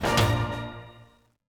Jingle, Message; rayman legends the complete soundtrack gamerip 2013

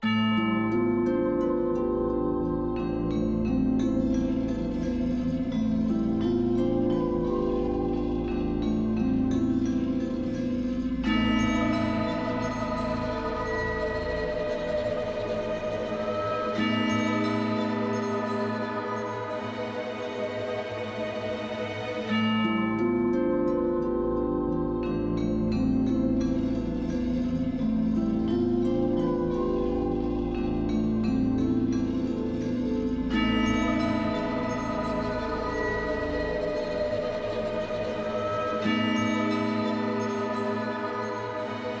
Suspense loop

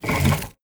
puzzle close

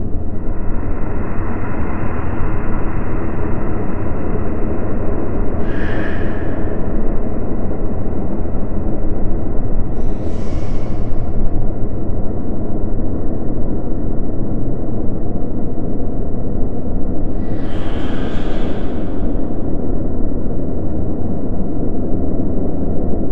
Wind Ambience; gauntlet seven sorrows

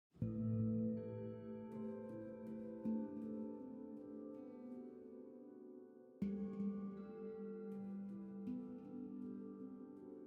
Northern Lights Alone

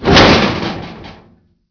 Door Close; Five Nights at the Chum Bucket

